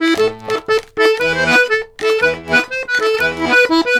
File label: RONDO, Accordions; USB Soundscan vol.40; AKAI